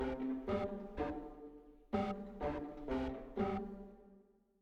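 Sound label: Deep Hole Dive Pizzicato; the legend of zelda tears of the kingdom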